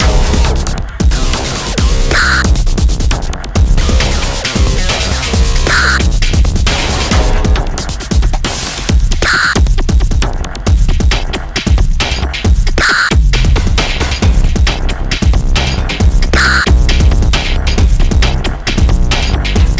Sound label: Servo